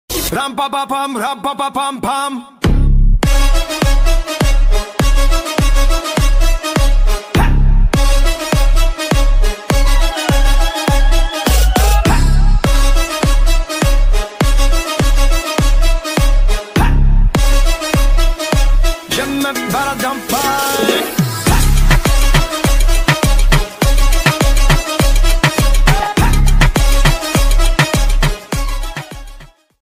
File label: Remix Ringtone